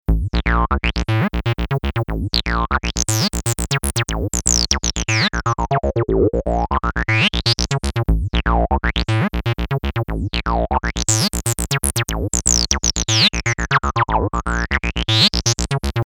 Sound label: FILTER